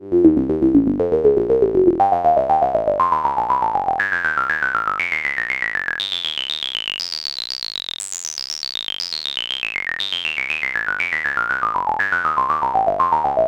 bandhipass

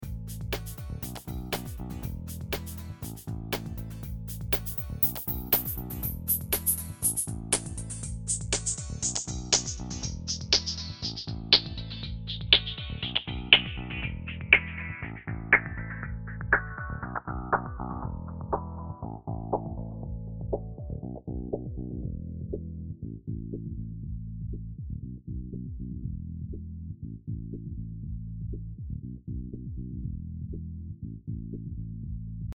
LPF 48dB Reso High

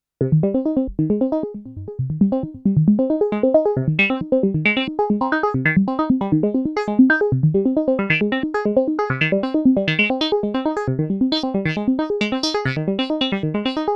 microfreak V4 Presets

